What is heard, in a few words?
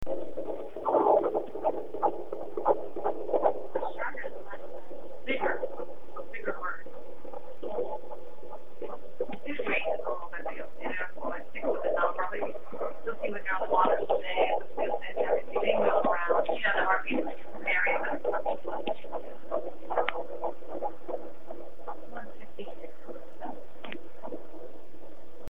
heartbeat